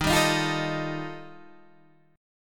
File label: G 7th Suspended 2nd Sharp 5th Eb chord